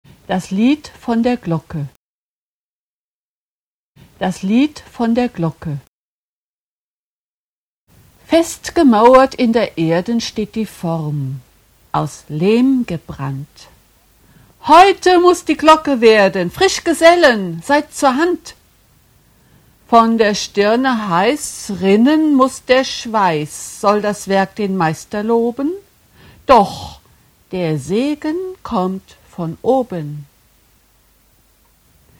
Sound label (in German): glocke